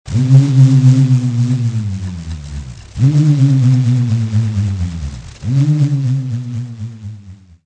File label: bullroarer; musical instruments